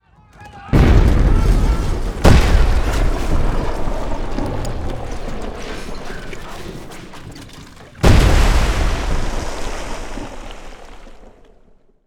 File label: Explosions Recreation; Color Purple